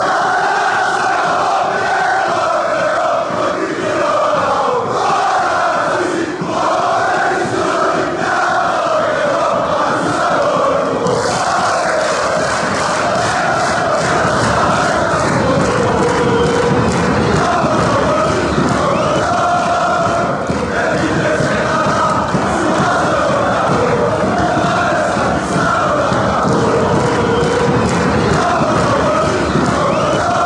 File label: ael chant, fanchants, cyprus